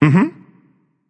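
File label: non verbal agree, voice; cernunnos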